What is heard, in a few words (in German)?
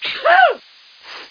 Arzt; 1602 AD